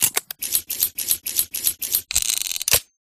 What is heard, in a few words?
colt45 reload, guns